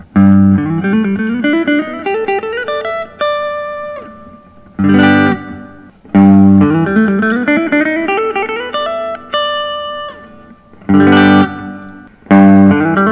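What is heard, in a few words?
Collings SoCo Deluxe Brazilian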